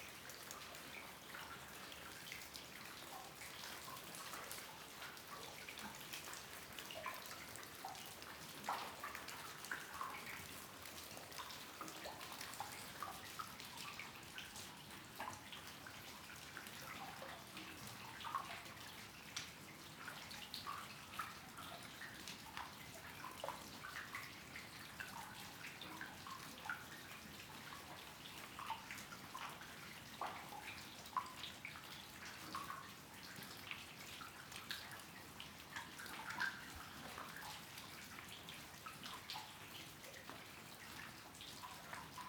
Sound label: Rain on puddle cave entrance; boom library; Thunder And Rain